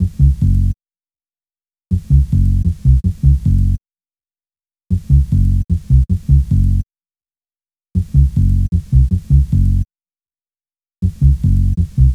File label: Bass, Hip-Hop